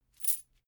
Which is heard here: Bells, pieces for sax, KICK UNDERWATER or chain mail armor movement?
chain mail armor movement